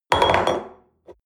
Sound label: espresso upset table medium, restaurant cafe